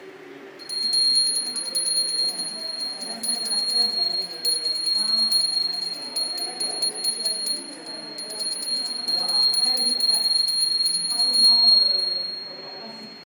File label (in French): cloche